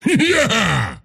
axe laugh; dota

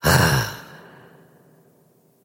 wolf attack emote; dota; crystalmaiden

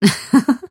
mirana laugh; dota

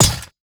metal blade drop, inventory; ui

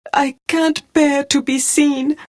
death; dota; phantom assassin

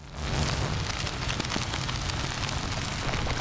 flamethrower target; weapons; dota; shredder

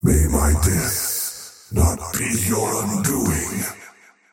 death; dota; faceless void

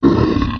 golem layer; towers

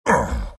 pain; beastmaster

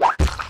leap; ogre magi; run rare

